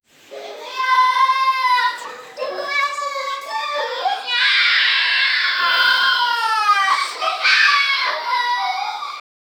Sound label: kids tremble in fear hidi; human